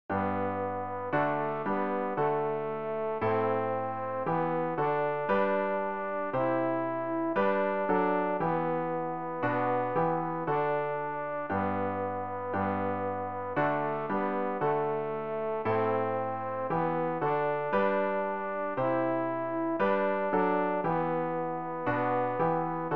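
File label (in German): tenor, Übehilfen; Ref. Gesangbuch; RG 84: Aus tiefer Not schrei ich zu dir (Strassburg)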